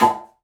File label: Didgeridoo Kit Samples